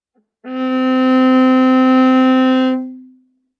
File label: Viola arco ff sul C C4